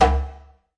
djembe big hit; ETHNIC and WORLD PERCUSSION

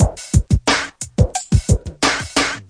089 electro funk, drum loops and breaks

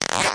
comb zip; Weird and Interesting Hits; single hits

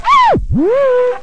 cue me up, Scratching and Turntablism

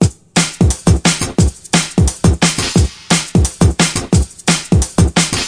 175, drum loops and breaks; 161 to 180 bpm

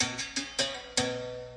berimbau loop; WORLD LOOPS

